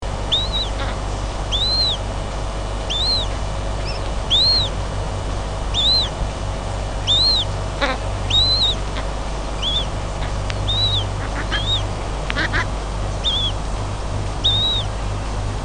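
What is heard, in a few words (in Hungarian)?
Pajzsoscankó, Madarak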